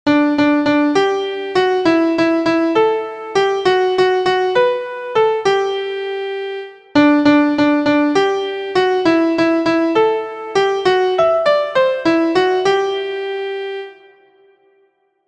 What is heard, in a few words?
MIDI